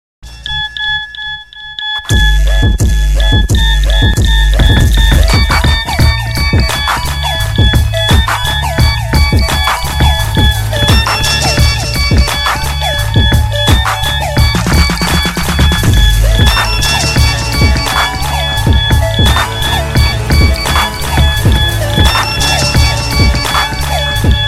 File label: Nokia Bass Boosted Tone